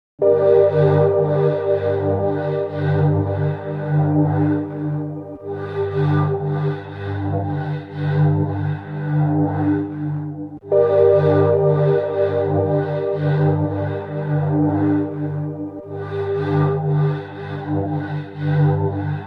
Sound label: Syntpad; Artplace